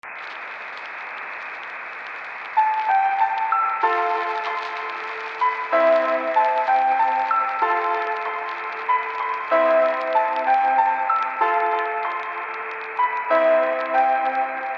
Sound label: Old Radio; Music; Deep Snow Delivery Demo